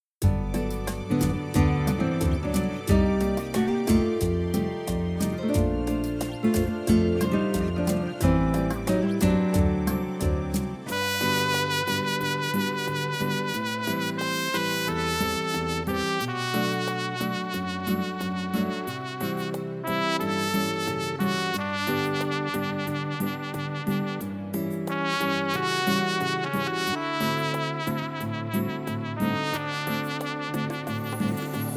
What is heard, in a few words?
rain (inst), Instrumentals